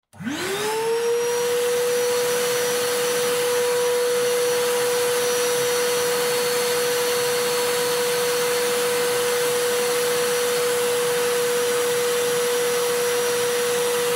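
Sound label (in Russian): пылесос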